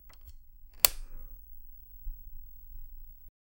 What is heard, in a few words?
sound of turning on a, lighting